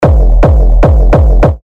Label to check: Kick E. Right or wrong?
Right